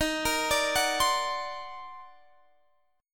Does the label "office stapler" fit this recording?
No